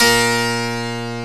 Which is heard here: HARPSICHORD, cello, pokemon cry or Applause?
HARPSICHORD